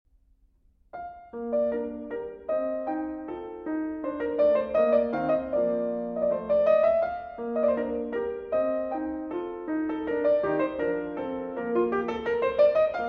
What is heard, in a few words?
Piano Sonatas in B Major Allegretto Grazioso; KV 333